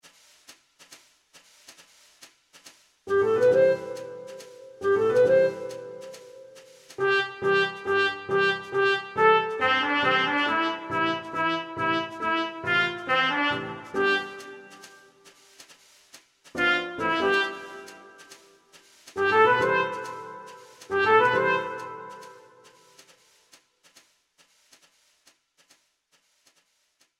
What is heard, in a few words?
Ringtone; FAX